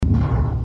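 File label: Space Duel Spaceship Fire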